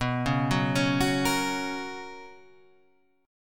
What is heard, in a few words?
Gb Major B; Gb/B arpeggiated; JGuitar